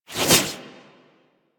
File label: Warfan Whoosh; Warframe; Weapons; Melee